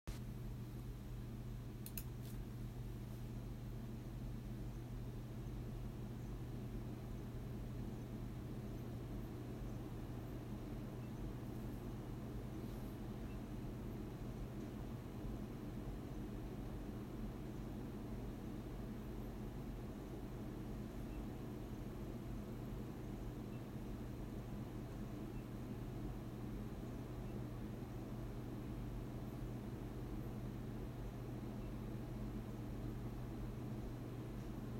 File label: ninja5 fan close